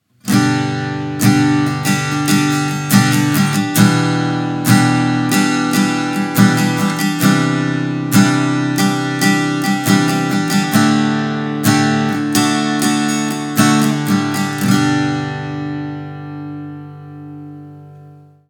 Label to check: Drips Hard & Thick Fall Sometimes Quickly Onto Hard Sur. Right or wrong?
Wrong